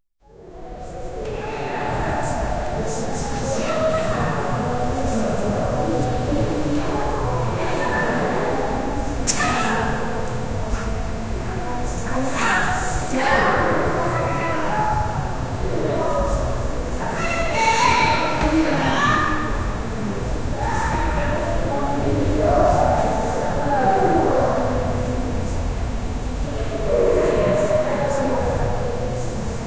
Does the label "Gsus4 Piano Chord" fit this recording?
No